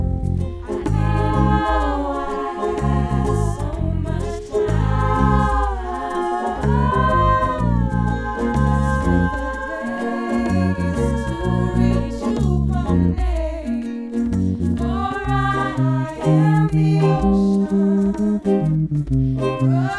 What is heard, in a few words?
harmonic